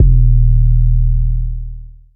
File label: Trap bass, EDM; 7rystan's 600+ FREE EDM SAMPLES & DRUMS